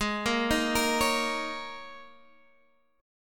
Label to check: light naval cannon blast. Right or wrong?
Wrong